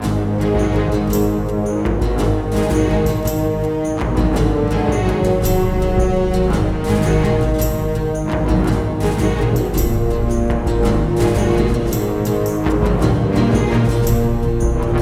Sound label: Boss combat; Bosscombat Ch11 02